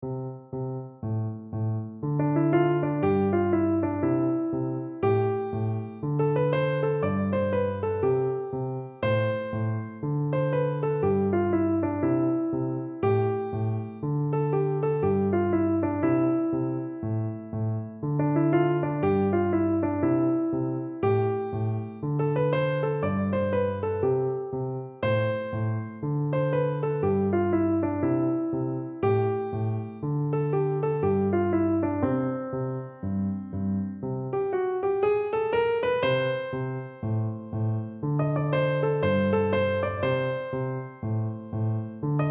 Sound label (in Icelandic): swing og aftur swing, piano; piano leikur 3 hefti